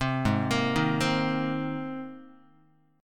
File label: G sharp Major Add 9th, Guitar; arpeggiated; 3,4,1,1,4,x; 4A,4E,5A,5D,5G#,6C#